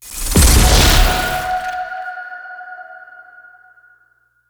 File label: skill sharp spell